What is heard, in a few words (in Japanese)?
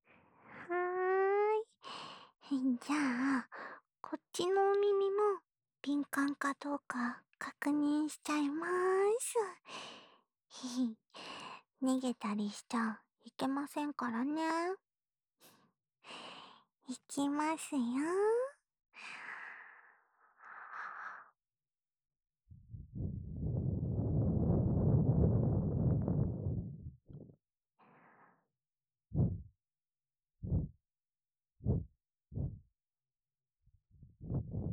左耳ふーふー; バカみたいな顔しちゃってるー♪